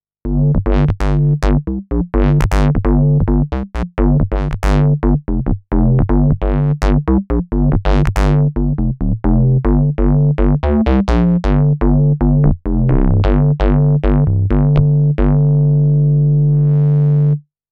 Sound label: Big Bass; Peter M. Mahr is a musician and synthesizer enthusiast from Austria living in Switzerland.